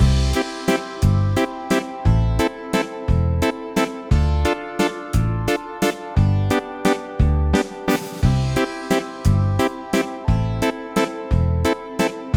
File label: Under the 8 Bit Stars Looping, chiptunes